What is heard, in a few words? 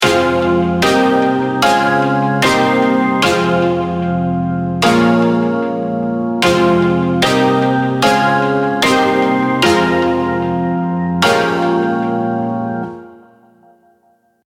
Dark Sad Bounce, piano